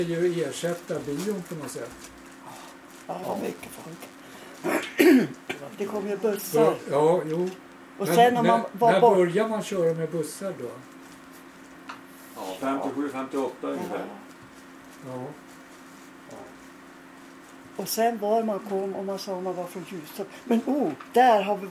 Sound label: Dans; ljustorp; Bygdegard